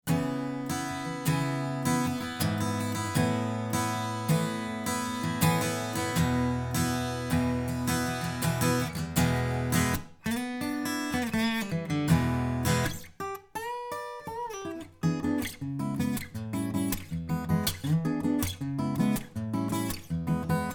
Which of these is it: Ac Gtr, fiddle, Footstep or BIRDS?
Ac Gtr